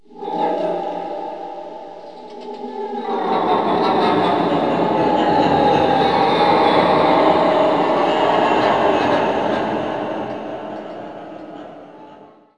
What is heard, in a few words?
drone; Music